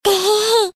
tehehe

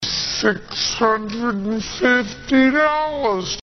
slow

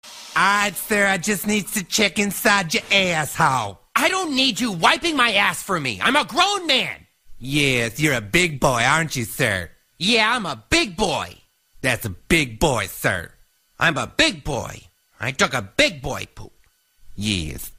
big boy poop

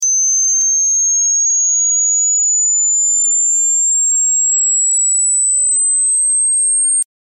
piep

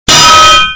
minecraft anvil loud

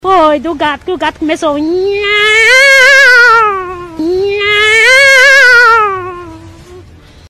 cat meowing

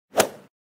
golf hit

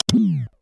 switch off

